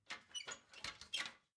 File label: ladder metal handling fold